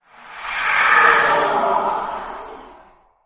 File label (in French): fantome spawn